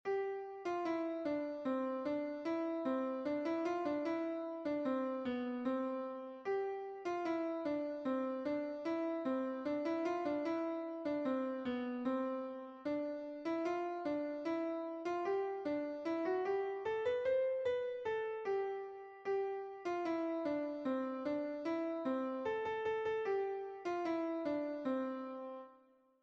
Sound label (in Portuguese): Deck The Halls Melodia